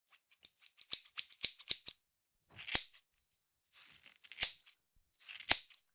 Cutting hair scissors snapping

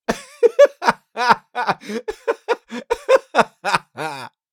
Man laughing with giggles and smirking

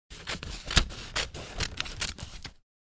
Scissors cutting bond paper

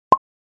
Cartoon funny pop

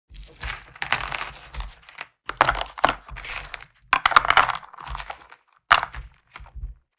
Board game box packing up pieces; leisure

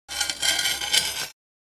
Sword blade scrape across rock

